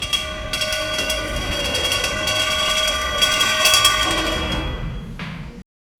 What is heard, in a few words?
hospital bed wheels movin